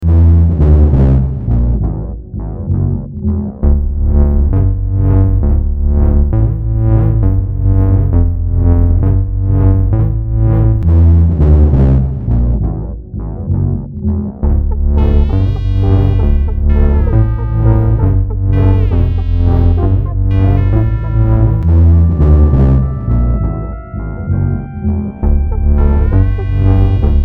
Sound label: robot party music